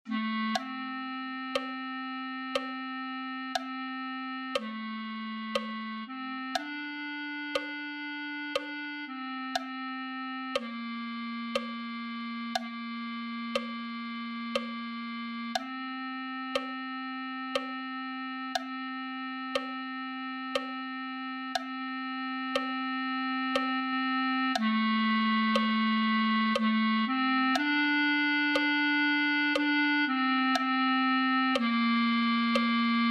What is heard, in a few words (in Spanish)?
clarinete1